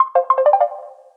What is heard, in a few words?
Message tone; Samsung; SGH-L870; SMS